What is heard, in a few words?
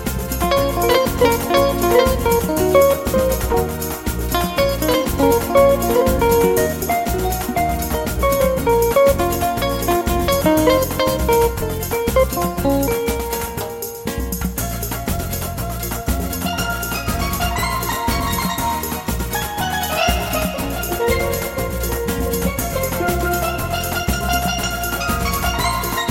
Samba Enredo